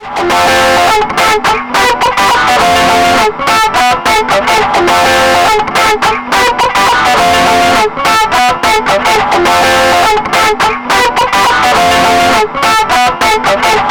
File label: Ableton Pedal DIST Gain75